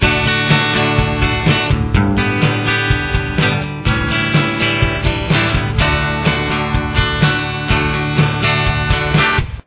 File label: guitar